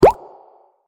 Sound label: menu back; AriizuCA's Skin V3.17.6 Tail Yellow